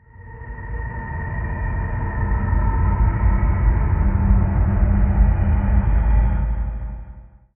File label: crippling fear aura; weapons; dota; nightstalker